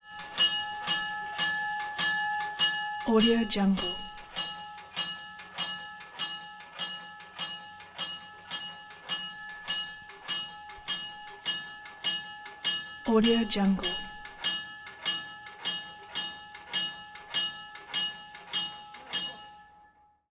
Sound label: dynamic train bell